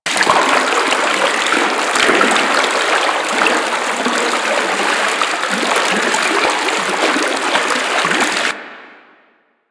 Ocean Drip Heavy Stream Loop; Ambience; Props; Warframe; Grineer Ocean

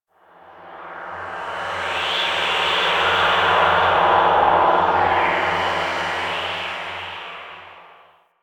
Exterior Void Fog, Ambience; Warframe; Rail Jack

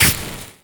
Laser Impact; Warframe; Weapons; Corpus; Crewman Laser

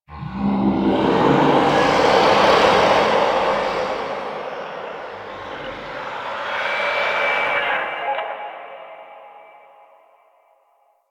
Distant Infested Low; Warframe; Ambience; Nineteen Ninety Nine

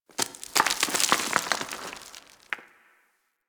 Outpost Rock Debris; Warframe; Ambience; Corpus; Outpost Defense Two

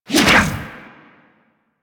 Ball Throw Whoosh; Missions; Tenno Ball

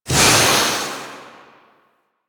Void Release Short; Duviri; Incarnon Adapters

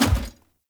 Heavy Grunt Boot Walk Default, Footsteps; Characters; Enemy; Sinecure

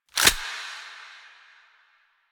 Pistol Reload Mag In; Weapons; DE; Warframe; Tenno; Lasrian

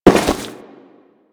Crewman Step Default, Footsteps; Warframe; Corpus

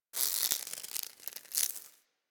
Tendon Stretch Small Close; Ambience; DE; Warframe; Infested Eris

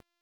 Delivery Key Explode; Warframe; Nineteeen Ninety Nine; PvPvE

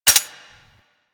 Uzi Mech; Warframe; Tenno

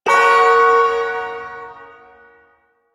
Ersatz Shawzin Hirajoshi Chord; Duviri

